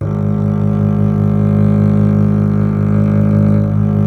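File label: string contrabass G; Miroslav Vitous; Solo Instruments VOL-2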